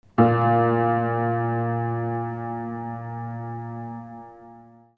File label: MUSICAL